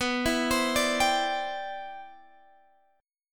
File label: G 6th Add 11th B, chord; G6add11/B arpeggiated